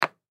Wood Shelf Place Object On Top; Blastwave

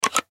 Switch Slide; Web Interactive; Technology; Multimedia; Slides; Objects

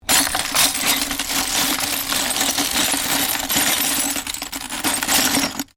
Glass shards movement; Material components for constructing more complex sounds; Materials

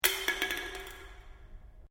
Can Aluminium Inside Drop; various metal cans; Drops; Objects

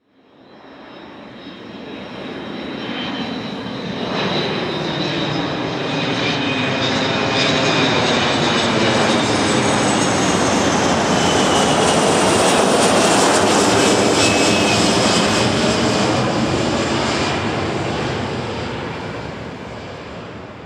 Warfare Jet KC135 Pass By Fly; Airplanes; Vehicles

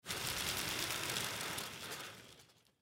Pin Wheel Toy Spin, Pin Wheel Spin Blow Fast; Spinning Sound Effects; Foley; Blastwave

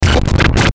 Glitch Chaos Blip; Production Element Imaging Element Accent Transition

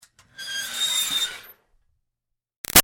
Screech Metal Iron Board Short Slow; Ironing Board; Materials

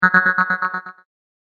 Abstract Reverberating Spring Boing

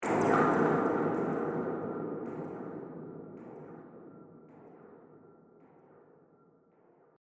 Distant Impact; Distant Ko Impact; Miscellaneous Impact Sound Effects; Andrew Fleming

